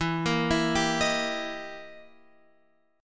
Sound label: E Minor Major 7th Flat 5th, E, down, Guitar, Open F; arpeggiated; JGuitar